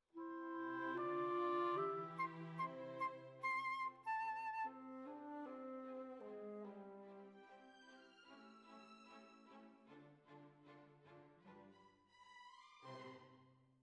Flute

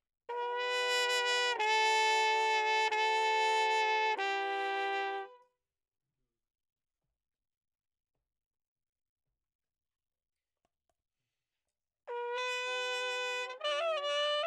Trumpet

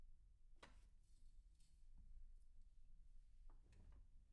Room A

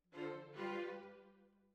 Violas Over

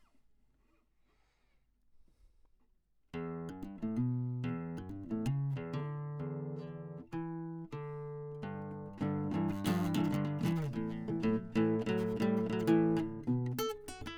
Classic Guitar

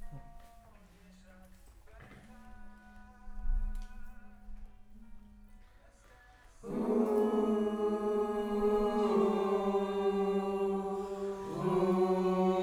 Choir Far

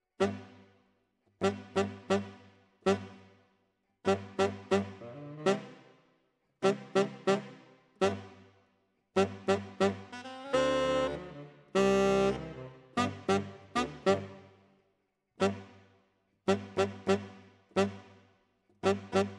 Tenor, RNR for piano

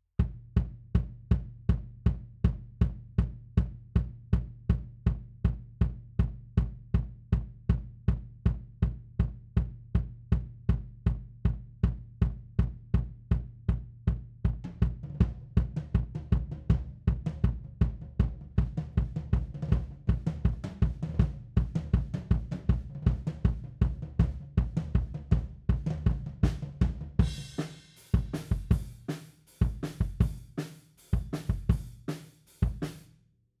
Kick Out, 160 BPM

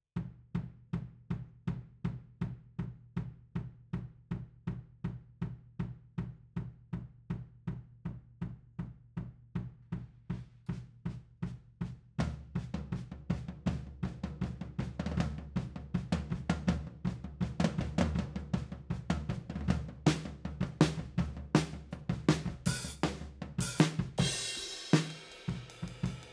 Overheads, 160 BPM

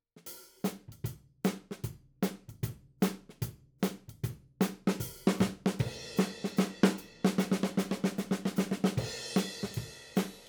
Ragtime

